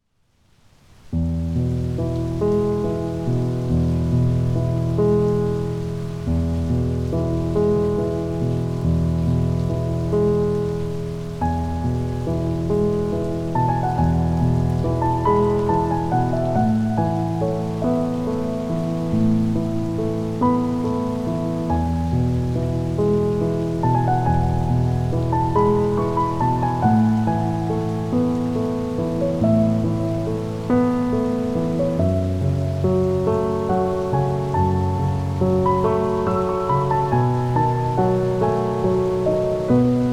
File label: Piano (With Rain)